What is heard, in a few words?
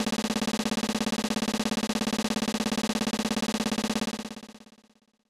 Drumroll; Koi ha Balance Battle of Lovers; SNES